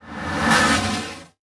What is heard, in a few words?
Transition Sweep Medium; Production Element